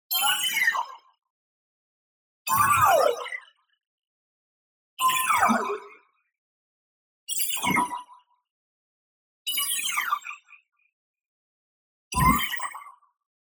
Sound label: TONAL Spectral Voice Fall; boom library; Anime Essentials